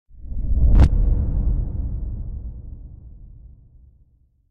Impact Deep Reversed Air Hit; Hollywood Edge; sound designer's toolkit v2

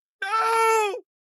town human road; Blind Drive

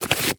Latex Gloves Box; Medical; Blastwave

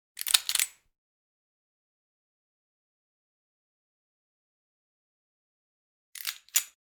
9mm Chamber Round; Hollywood Edge; Heavily Armed

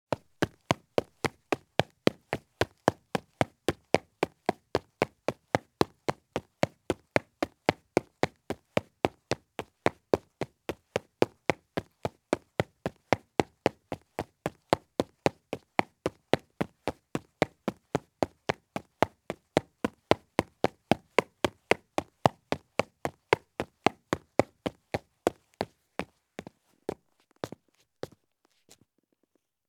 Soft Hiking Boots Run on Concrete; Sound Ideas; Footstep Sound Effects

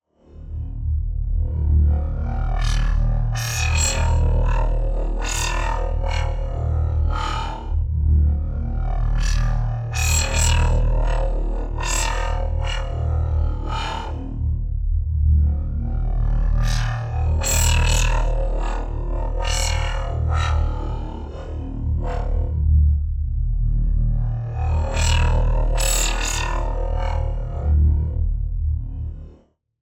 TEXTURE Pulsating Bass; boom library; Neon

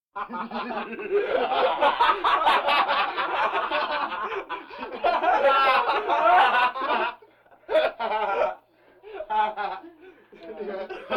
Vocal effects breathing Moaning Baby

